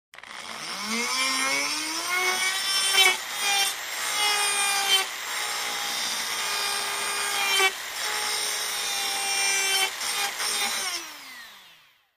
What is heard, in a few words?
Dremel tool grinds wood at variable speeds, then shuts off.; Tools, Hand Grinding, Tool Motor, Tool